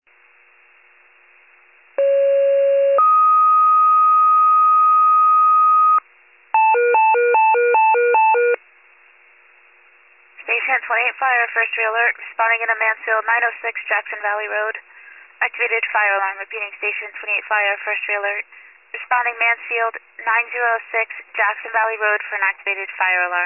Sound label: FIRE pager alert